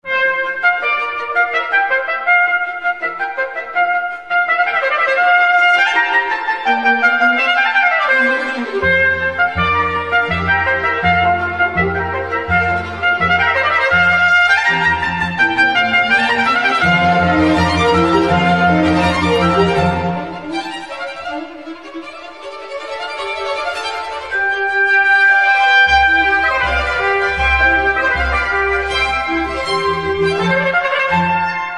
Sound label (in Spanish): tono trompeta concierto; hydn